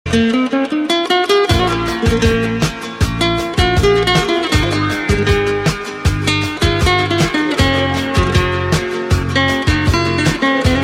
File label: Instrumental Ringtone